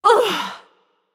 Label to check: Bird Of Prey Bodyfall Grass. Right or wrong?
Wrong